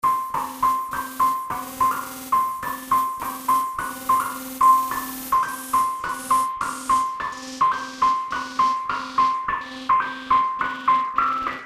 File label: Bass Morphing